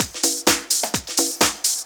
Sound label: Latin Loop 128 BPM